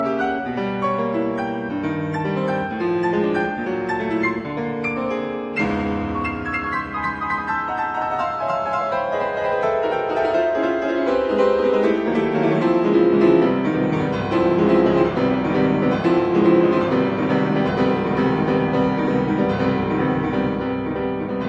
Cubanas for piano Preludio en son; Hernandez; Juana Zayas

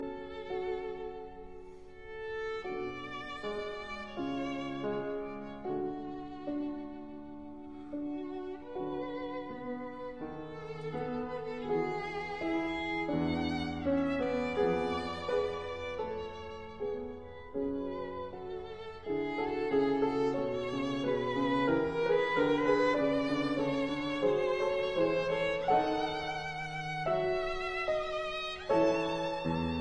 Serenade in E major Larghetto; orch N Ingman

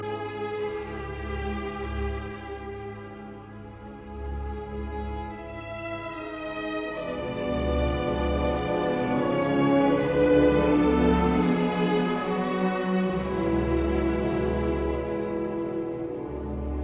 Symphony in D minor Feierlich; Bruckner; WAB 109; original 1894 version ed A Orel; Misterioso

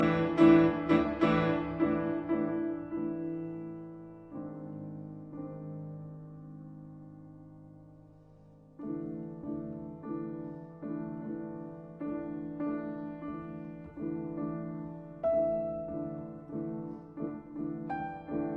Cubanas for piano; Hernandez; Toque de clave; Juana Zayas

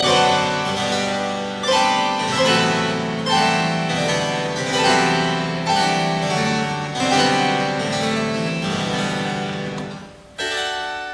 version for keyboard, Chorale; Cowell; Set of 4

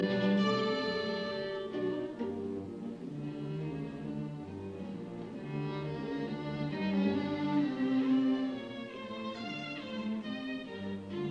Violin Concerto No 2 in G minor, Allegro moderato; Prokofiev; Op 63; Jascha Heifetz

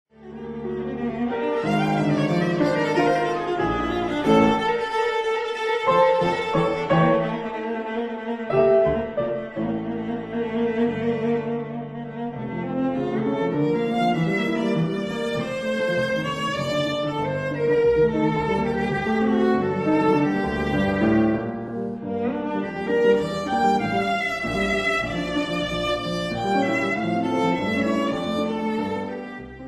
Albumblatter For Viola Piano Allegro molto vivace; Sitt; Op 39

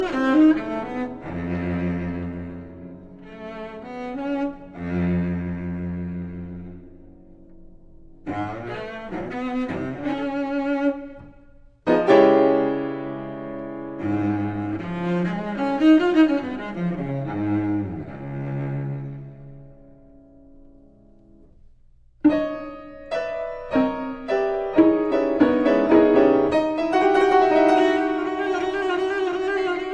Cello Sonata quarter note = 72; Turok; Op 50

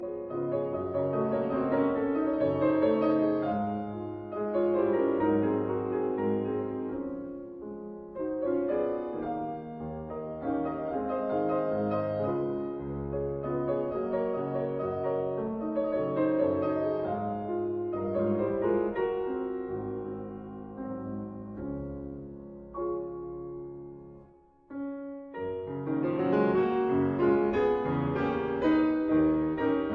Waltz in D flat major; Chopin; No 13; Op 70 No 3

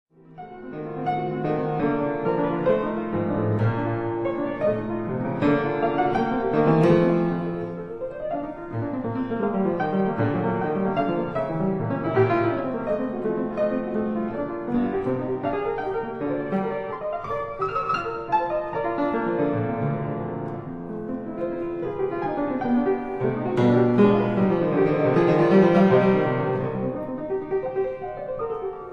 Etudes for piano, B flat major

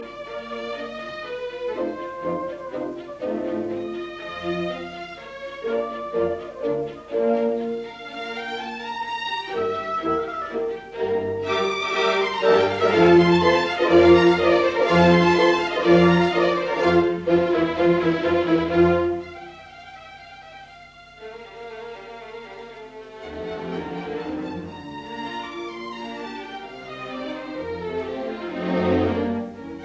Piano Concerto in B flat major Allegro; Mozart; No 27; Op 17

